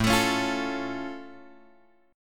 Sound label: A Augmented A; A+ Chord {5 X 7 6 6 5} strummed